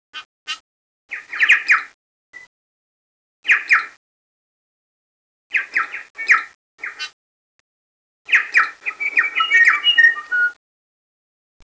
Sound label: bellbird